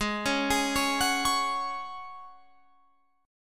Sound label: Ab 7th Suspended 4th Ab down Guitar Open F, Chord